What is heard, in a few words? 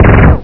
UZI FIRE